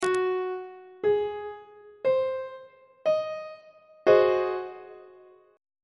g sharp dominant 7th 3rd inversion on treble clef

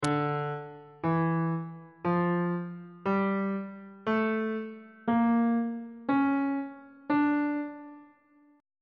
c double sharp harmonic minor scale on bass clef

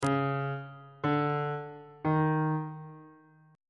a double flat 5th intervals on bass clef

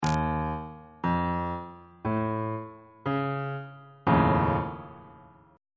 f augmented 7th 3rd inversion

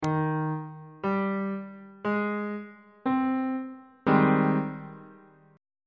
a flat major 7th 2nd inversion on bass clef

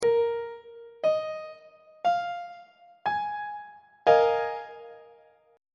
a sharp dominant 7th suspended 4th chord; on treble clef

